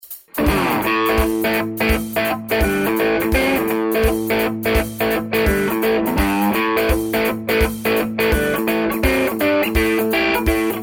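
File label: classic highgain bridge neck, humbucker; schwarzbach; HB59-Classic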